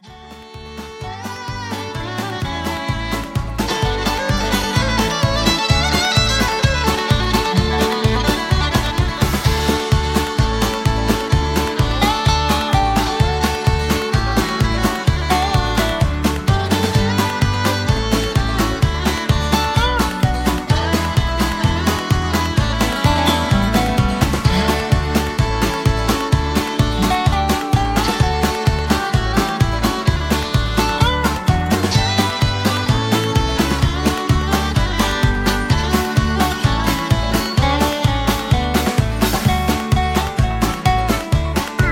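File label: Instrumental with background vocals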